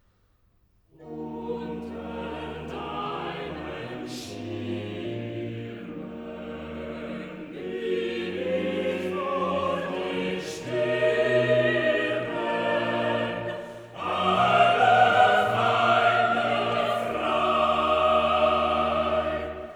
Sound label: Motet; Unter deinem Schirmen; Bach; BWV 227; Jesu, meine Freude